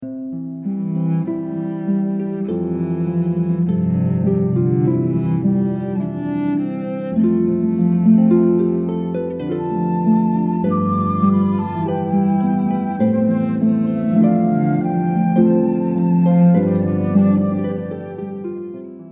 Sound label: harp flute cello